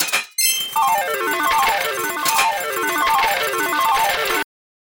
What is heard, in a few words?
casino slot machine